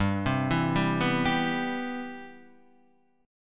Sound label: C Major 7th, G, guitar chord